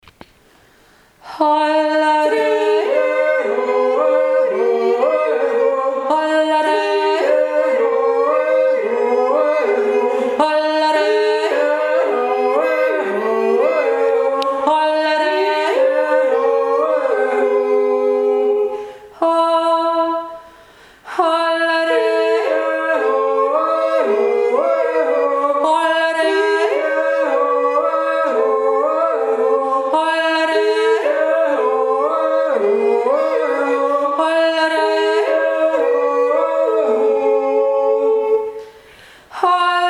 dirndljodler

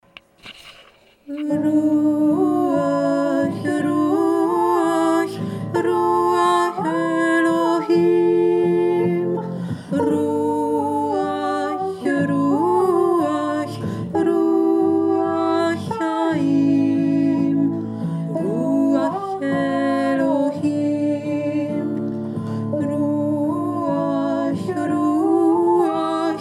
ruach zweistimmig

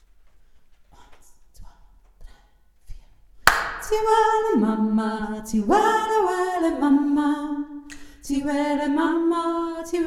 hauptstimme

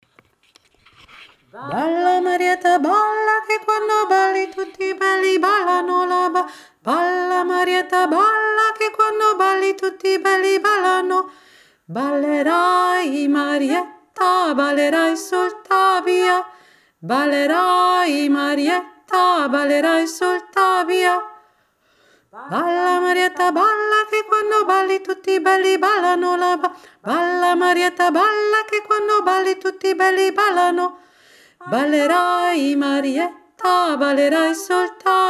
zweistimmig langsam; marietta

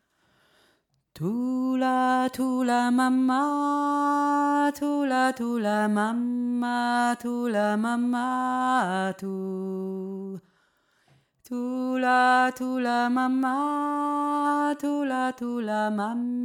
thula mama tiefe stimme

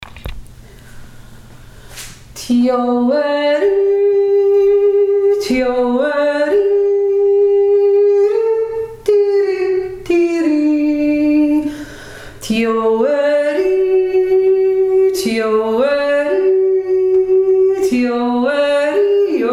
eichberger 1 stimme, jodler

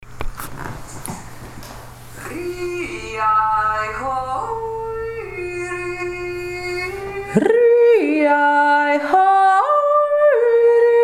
laugenlutzer 2 stimme

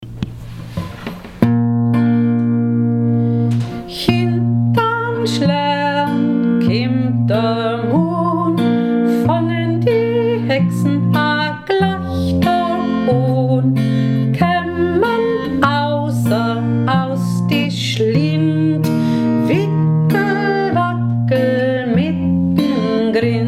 mit git

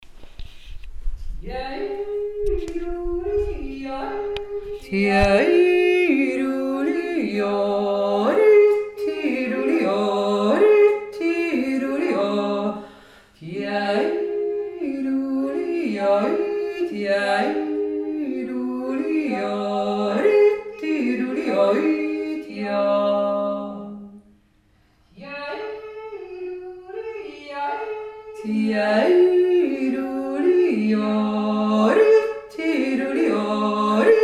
tiefere stimme wurmstoaner

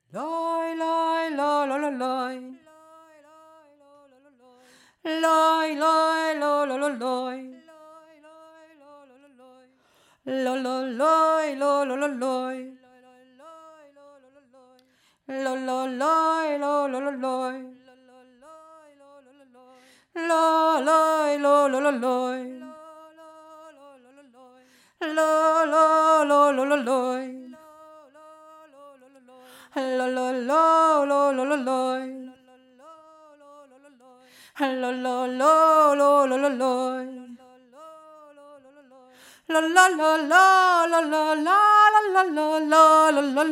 iranischer gesang der frauen vorgesang

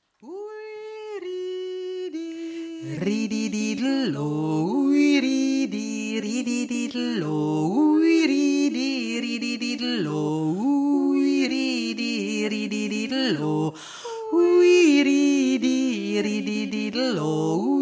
2 Stimme

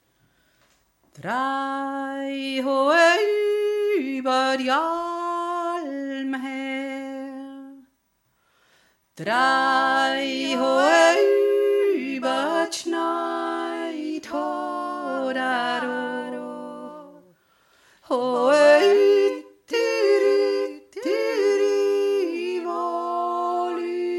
hauptstimme; drei hoe ber d olm

